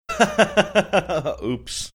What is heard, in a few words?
laughing saying oops